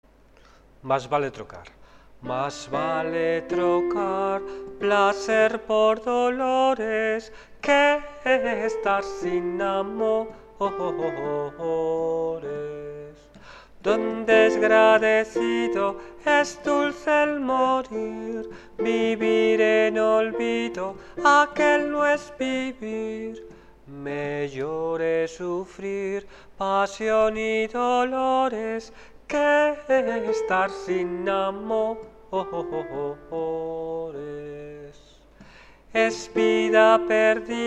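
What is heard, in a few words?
soprano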